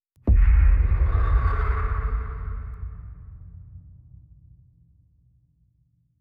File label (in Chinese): 场景转换